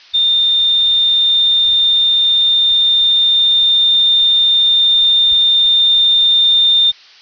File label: flatline